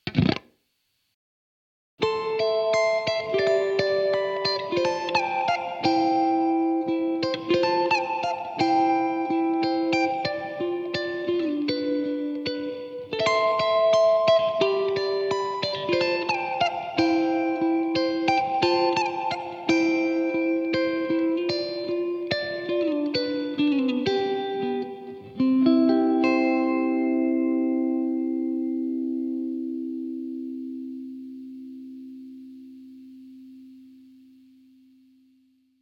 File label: Music Box; Prancer